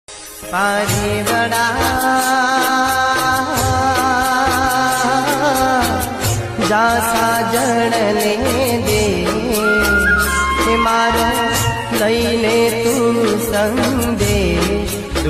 gujarati new ringtone love romantic love ringtone sad new ringtone